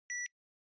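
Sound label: Portable MiniDisc Beep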